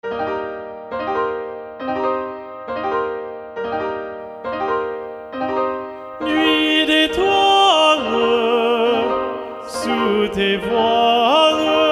alto predominant; nuit detoiles ssa; claude debussy arr. alan raines

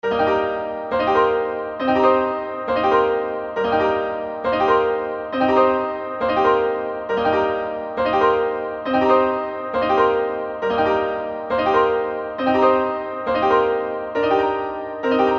ssa accompaniment track; claude debussy arr. alan raines

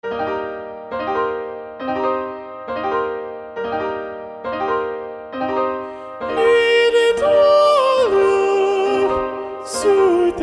ssa soprano 2 predominant; claude debussy arr. alan raines

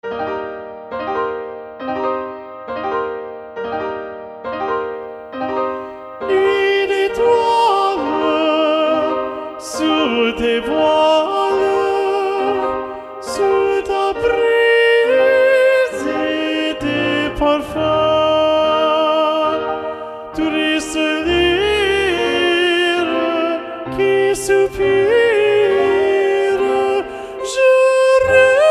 ssa soprano 2 predominant; claude debussy arr. alan raines

